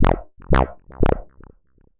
ejsid haus odinochnij rezkij

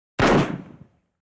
odinochnij vistrel vintovki m-16

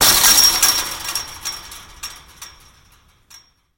vnezapnoe gromkoe drebezzhanie

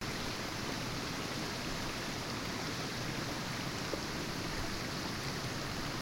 shum vodnih potokov na rasstoyanii

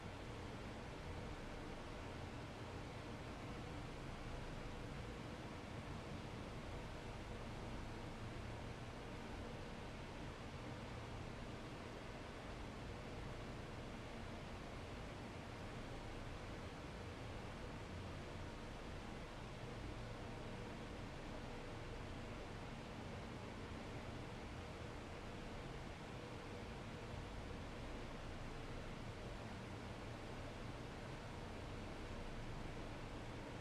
vnyatnij spokojnij dolgij zvuk shuma proizvodstva

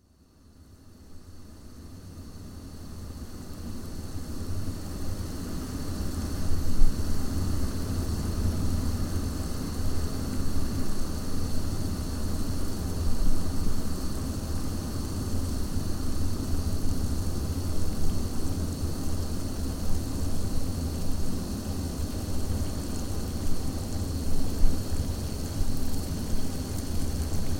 zvuk zakipaniya vodi v kofejnike bojler kofevarka

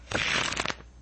karti v rukah umelogo kartezhnika

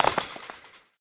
drebezzhanie posle padeniya

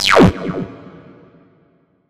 ejsid haus odinochnij rezkij blizkij

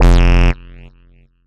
ejsid haus odinochnij rezkij dolgij

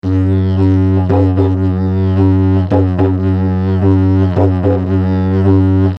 didzherido melodiya s vibraciej

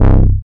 ejsid haus odinochnij nizkij myagkij

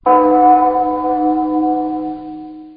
gong odinochnij visokij

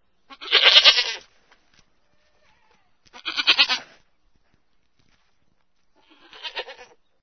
ustavshee bleyanie staroj kozi

